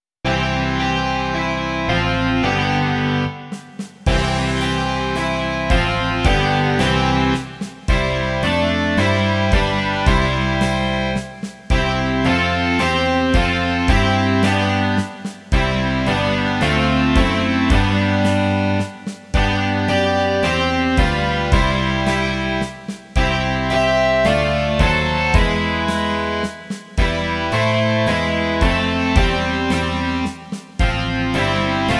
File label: rock